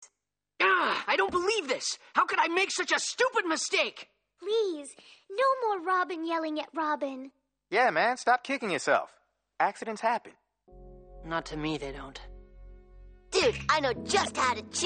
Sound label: yelling